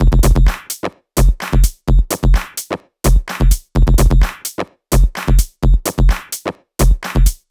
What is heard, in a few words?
128BPM Air Raid Kit